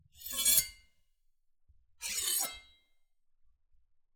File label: Sword Or Knife Draw